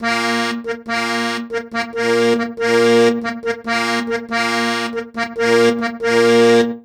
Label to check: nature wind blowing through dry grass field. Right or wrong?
Wrong